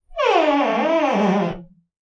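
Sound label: door creak small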